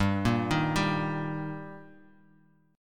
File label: G Minor Sharp 5th, chord; arpeggiated; 15,13,13,12; Gm#5; JGuitar